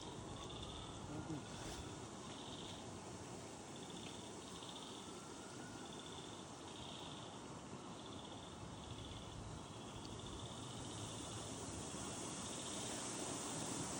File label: frogs; Yellagonga Regional Park